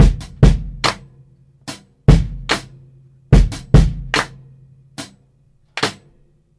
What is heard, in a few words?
clap to the pauses, Boombap, Drum loops